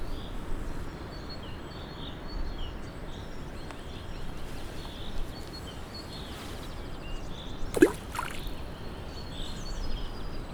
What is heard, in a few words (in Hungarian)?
kisebb kavicsok vizbe dobalasa, viztarozo; menesviztarozo